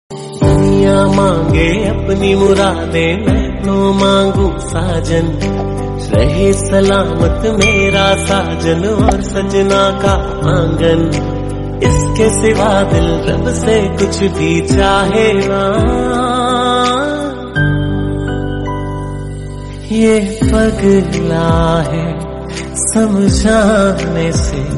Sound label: Old Hindi Song Ringtone; ringtones